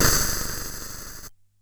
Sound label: Bent Cymbal